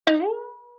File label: Violin Pluck Pitch Rise